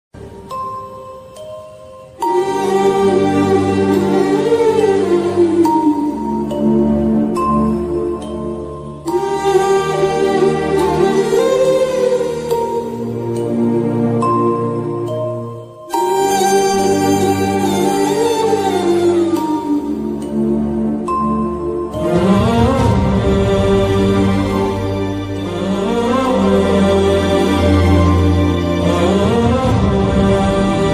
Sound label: love bgm telugu; chitralahari